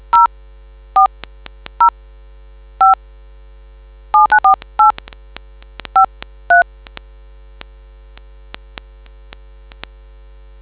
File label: dtmf